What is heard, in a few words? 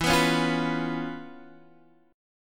C sharp 7th E; C#7/E; strummed